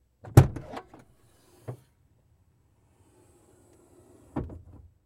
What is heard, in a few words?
Expedition Rear Door, ford expedition